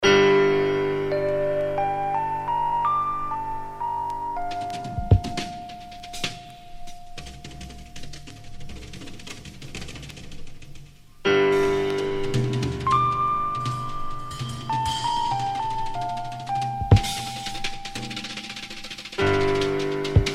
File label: vibes n drums